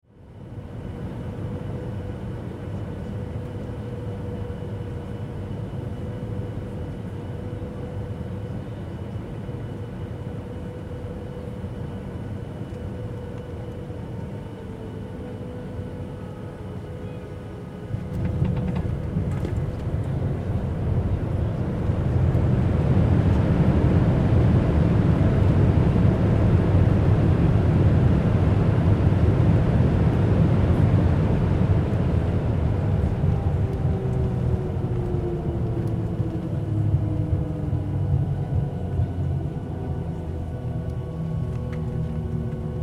Vehicle Airplane Landing Interior Commercial Airline; Airplanes